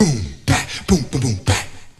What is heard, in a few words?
human, Beatbox; Drum loops